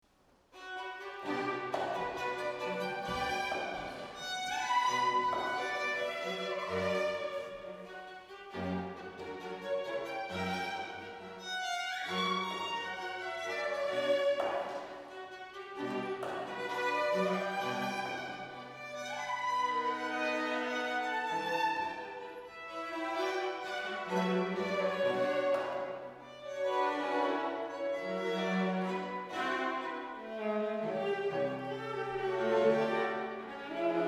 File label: Tango